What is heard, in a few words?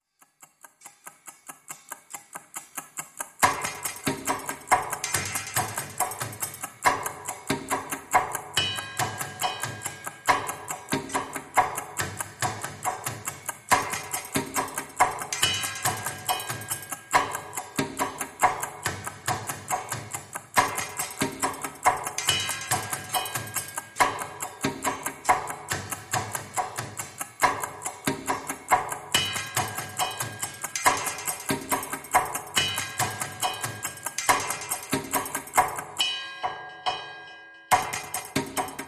Hits Only